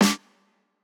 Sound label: Jrock snare